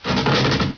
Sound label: chair drop, chair falling